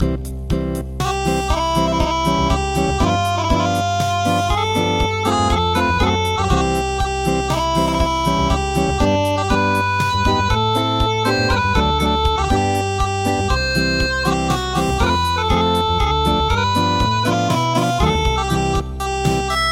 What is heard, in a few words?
Acoustic